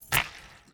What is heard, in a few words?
snaredrum